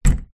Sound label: household door close internal wood modern; zapsplat